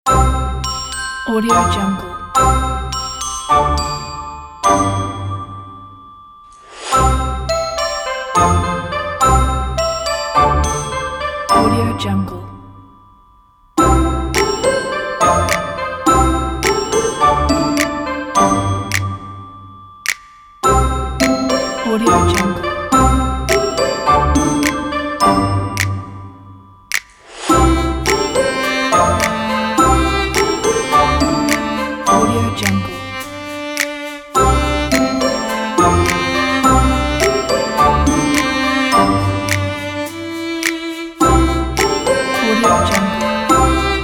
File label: happy cinematic advertising intro